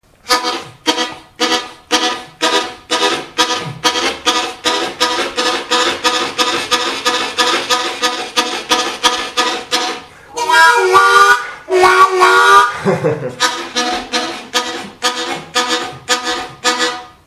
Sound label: Panamerican, harmonica